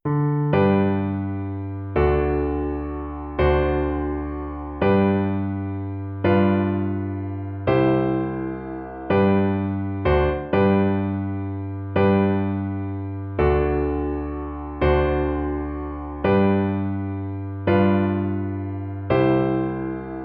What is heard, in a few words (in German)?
Happy Birthday Geburtstag In G; G-Dur